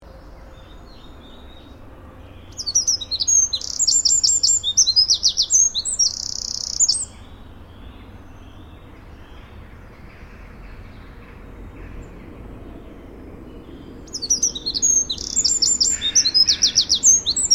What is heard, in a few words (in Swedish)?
Gardsmyg; Wren; Troglodytes troglodytes; Arnold Meijer